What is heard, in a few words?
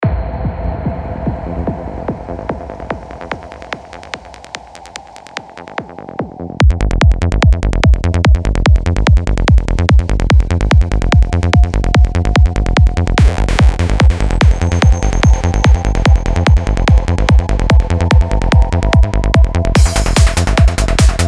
Psychedelic; Mecha MIDI Vol1